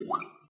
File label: Plop